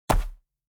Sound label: stamp; soundscrate